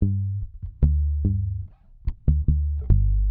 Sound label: Electric Bass; Basses